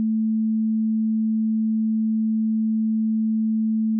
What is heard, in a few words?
bgm